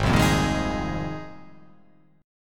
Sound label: G sharp 6th Add 9th G sharp